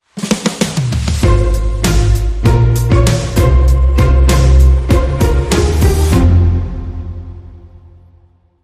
Hybrid Sting

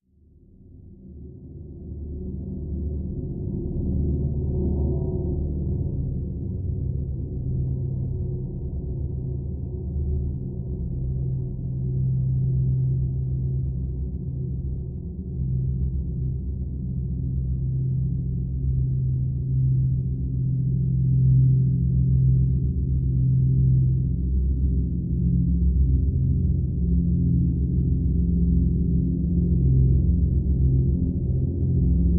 Bomber Aircraft

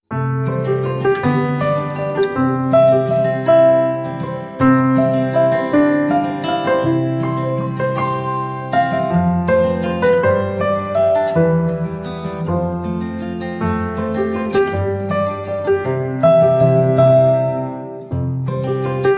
Guitar & Piano

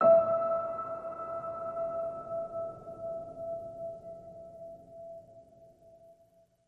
Piano Ping E

